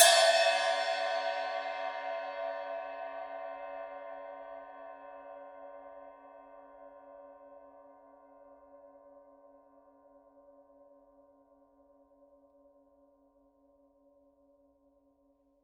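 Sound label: signature 13 mega cup chime edge, cymbales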